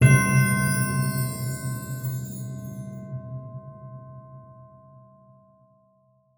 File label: Stingers Sting Chance Success